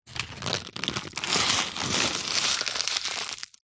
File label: foley leaf dry pick up from concrete squeeze crush; zapsplat